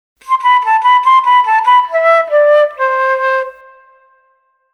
fluitmelodien

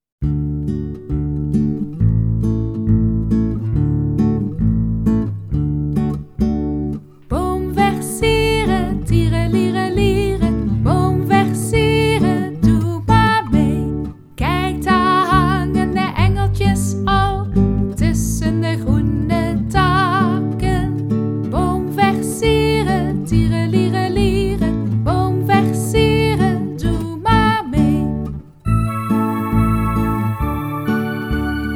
met zang

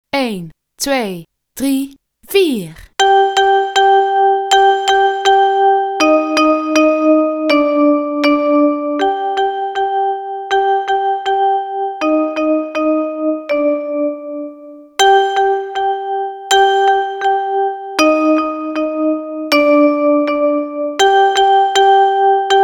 speelstuk

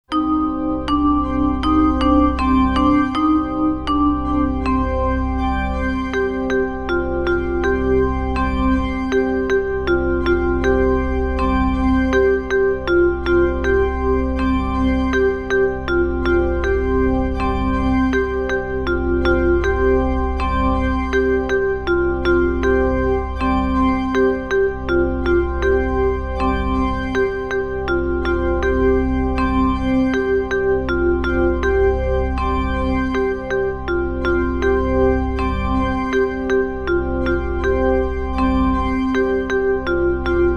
humming round zonder zang

